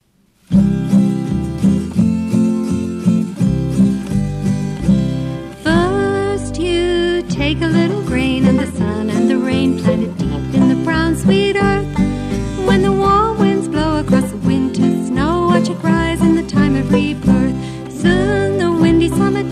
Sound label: Grinding Song